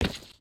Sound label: step wood